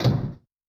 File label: Door; Yamaha DTXpress-II